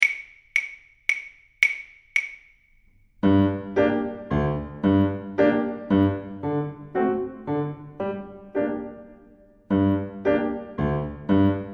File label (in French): bal musette, saxophone